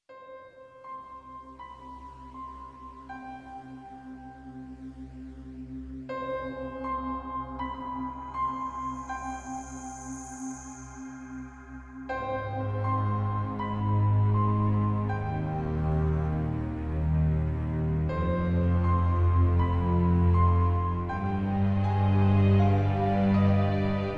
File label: Classical